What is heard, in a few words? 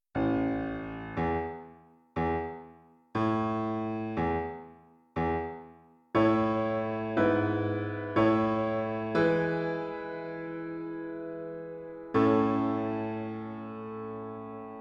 Piano